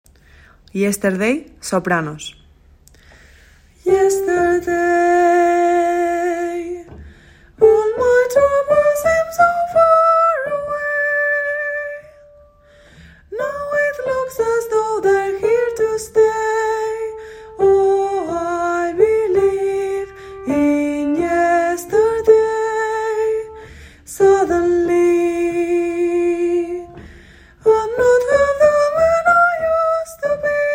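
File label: soprano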